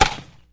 baseball, Misc Percussion Ticks

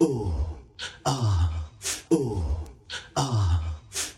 breathin; Drum loops

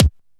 punch, Kicks

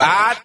"ah"

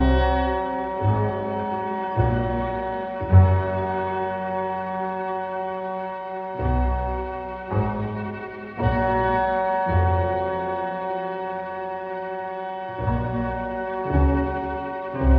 strings creeping and crawling, Sad; Loops

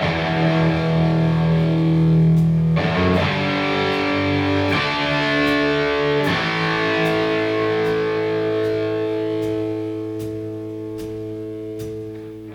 guitar, Loops

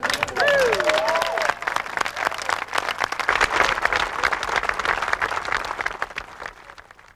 applause

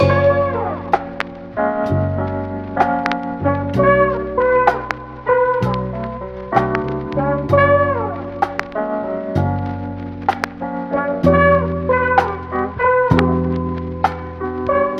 horns, Phonky loops

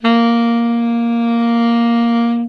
sax; Winds

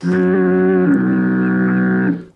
stinky sound

